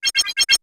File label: Alert; Samsung; SGH-i300